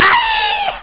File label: scream